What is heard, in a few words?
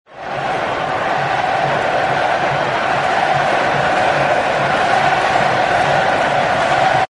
soccer crowd building cheer